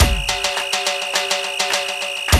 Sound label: Loop, Roland; Sound & Vision - Gigapack I